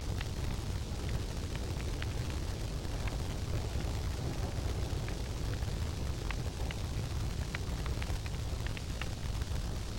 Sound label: furnace; factorio 2.0.55